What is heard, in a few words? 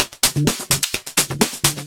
Electro Loop 128BPM; Vengeance Samples